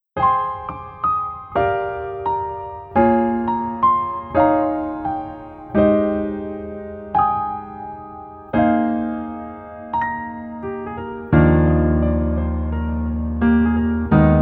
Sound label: Piano ver, 멜로디MR